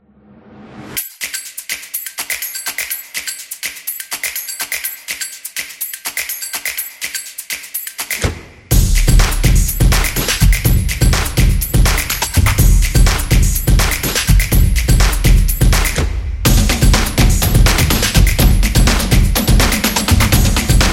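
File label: Image Slideshow, Street percussion background music built for sport, motion, and dance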